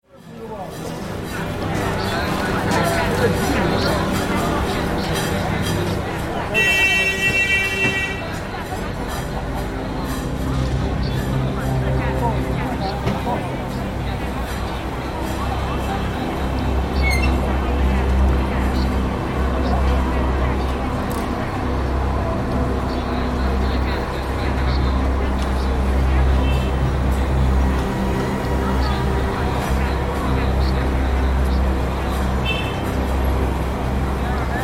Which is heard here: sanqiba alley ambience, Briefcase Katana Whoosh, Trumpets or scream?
sanqiba alley ambience